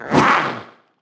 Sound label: windup